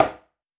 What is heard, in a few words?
bongo one shot acoustic; Percs